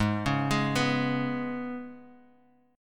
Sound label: G sharp Minor Double Flat 5th G sharp, Guitar Half Step Down; G#mbb5